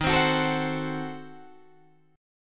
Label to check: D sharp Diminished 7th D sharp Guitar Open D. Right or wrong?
Right